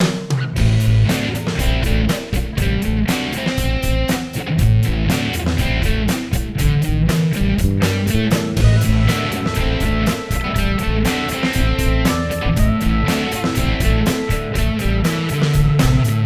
Funky Fried, added keys

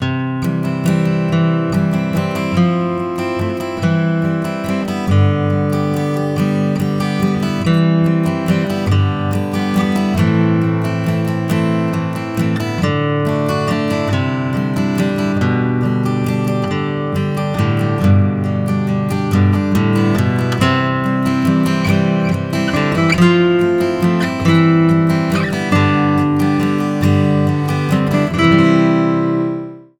no pads

